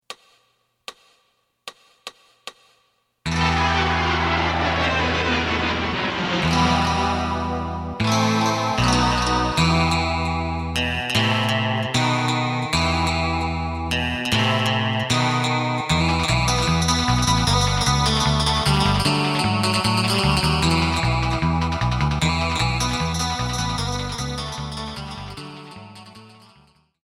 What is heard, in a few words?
drum